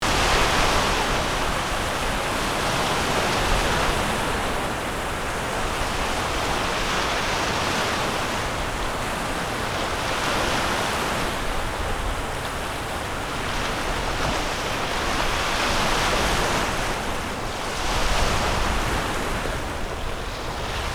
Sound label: Sea